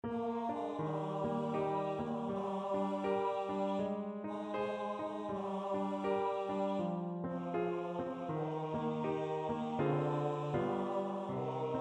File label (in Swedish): ljudfil, baryton